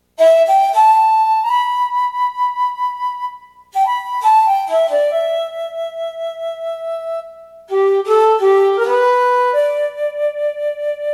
Shakuhachi

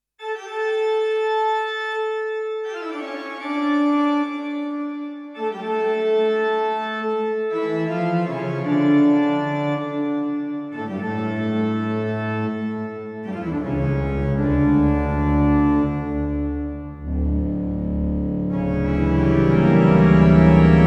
Organ, Large gold plate; Sony DRE 2000; Sony DPS-R7

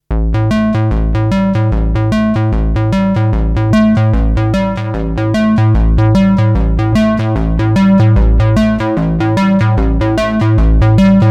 Formant Env PWM Band Pass Filter

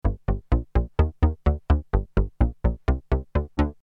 poly d bass chorus2

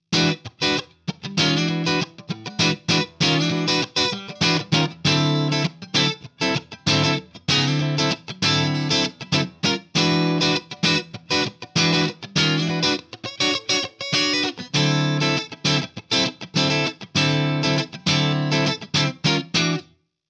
Reverb Funky Clean; Guitar Rig 7; Reverb Delight

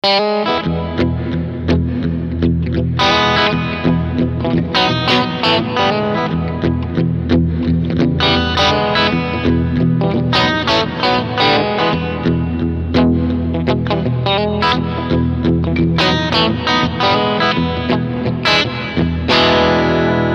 Fast Decay

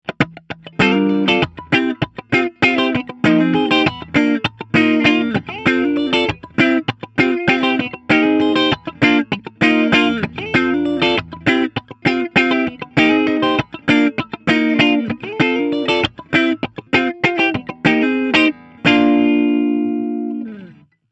VOX AC100 Clean

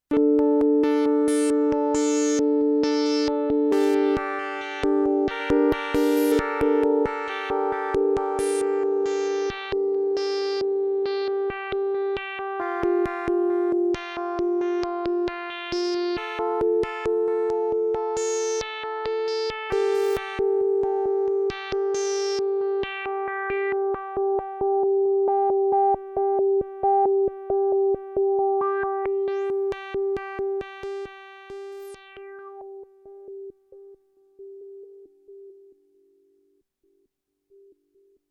microfreak V4 Presets

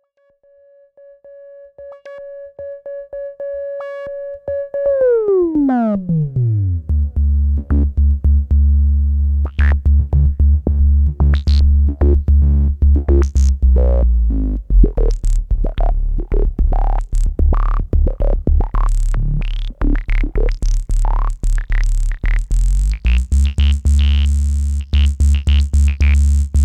cs40m 1vco subbass

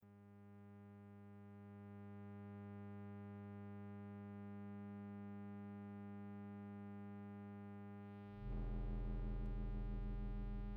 Flanger

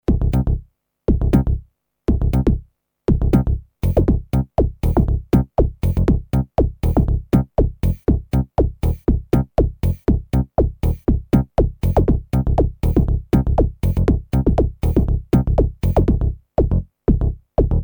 Carbon Mute Pattern

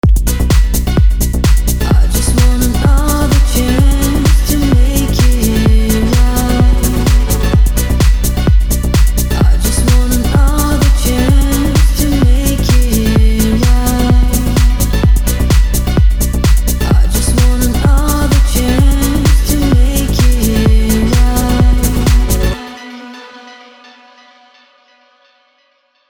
Melodic Tech Voices; Vengeance Sound Avenger 2; SQ Another Chance

